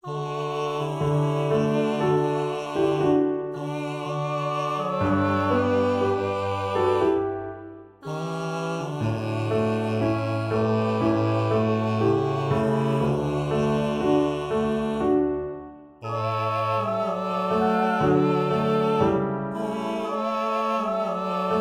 Quartetto; Nel mirarvi o boschi amici

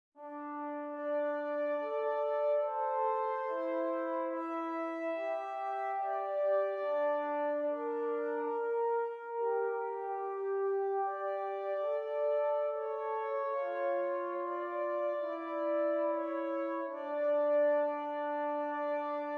Esercizi di contrappunto a due voci; viertes Gattung; Ronge pagina 60

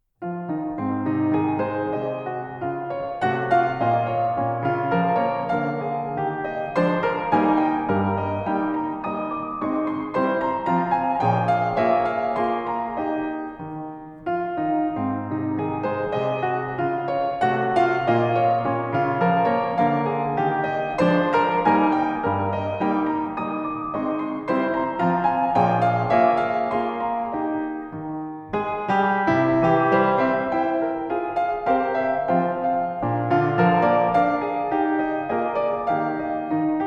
Valzer, pianoforte; von Vice Kapellmeister Erasmus Kessler

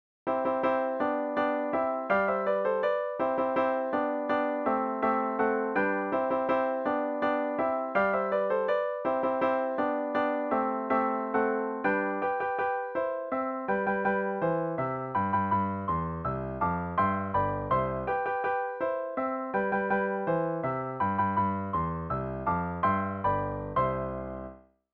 Marcia per pianoforte

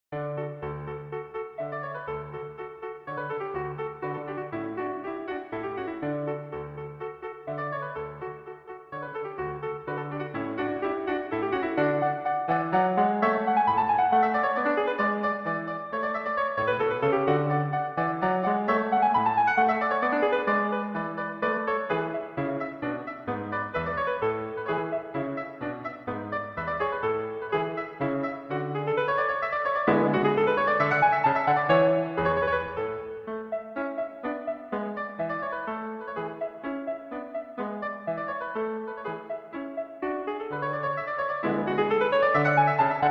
Allegro, pianoforte; Mozart; Allegro di D

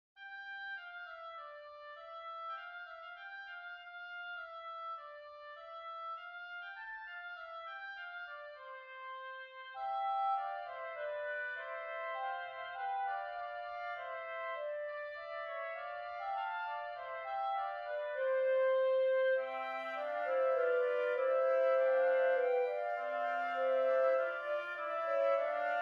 Canone a quattro voci aperto

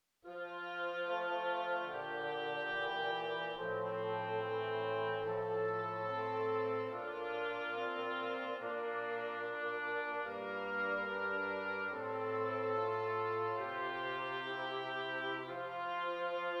Esercizi di contrappunto a tre voci; Zweite Gattung; Nottebohm pagina 51; corretto

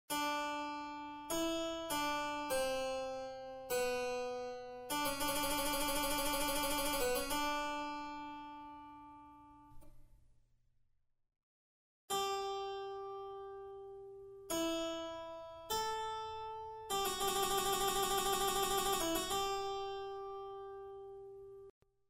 Tema e risposta

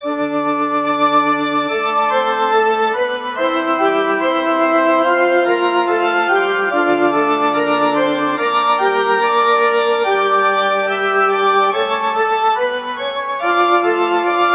Esercizi di contrappunto a due voci; Funftel Gattung; Ronge pagina 60

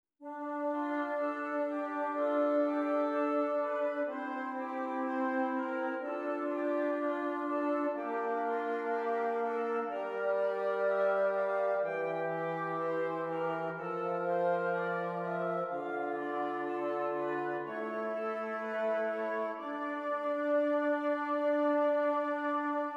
Esercizi di contrappunto a tre voci; dritte Gattung; Ronge pagina 62; corretto

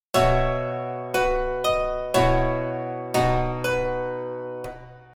Risoluzione ritardata della quarta e della nona

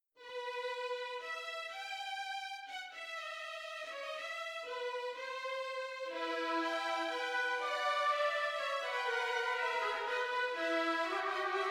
Orchestrazione; Ronge; Gerd Prengel

